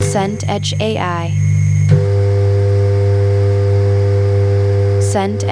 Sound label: microwave run